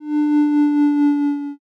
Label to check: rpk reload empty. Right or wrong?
Wrong